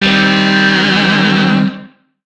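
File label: Shawzin Pentatonic Minor Chord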